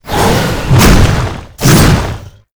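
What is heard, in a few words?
flesh golem attack, monster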